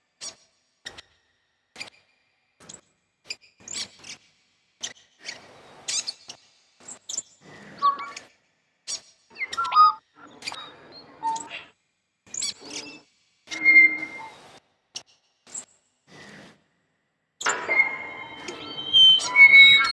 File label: Birdsong